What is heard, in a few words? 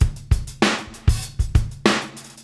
Beat Loops, 098; Attitude; Zero-G - Monster Beats